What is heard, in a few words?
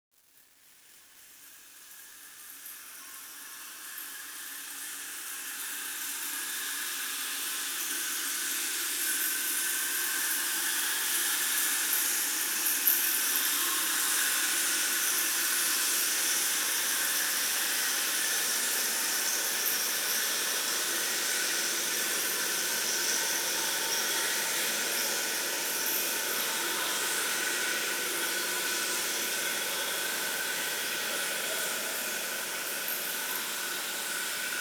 Splashes Trickle, Chalice Well